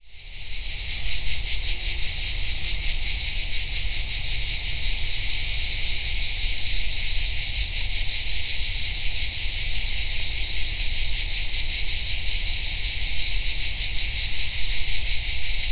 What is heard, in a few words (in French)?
Cigales